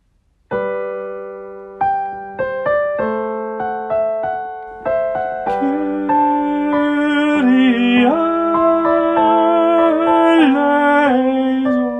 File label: Kyrie Tenor